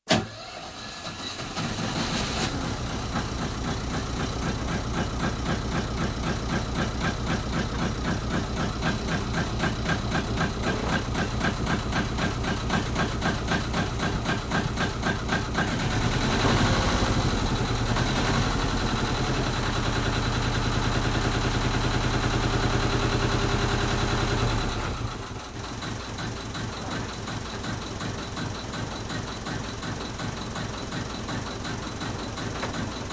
Yacht, engine started, rev engine, astern gear selected, neutral selected, ahead selected, into fast constant run; 30ft sailing yacht 'Alice May', Halmatic 30, GRP, sloop rig; Boat; BBC